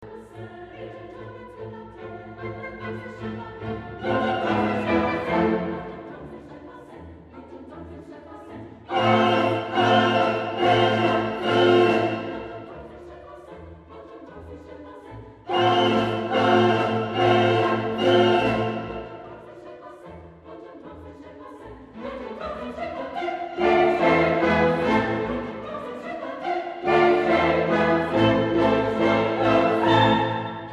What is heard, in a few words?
witches chorus